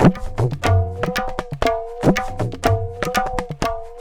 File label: Tabla, 120 BPM; Zero-G; Pure Tabla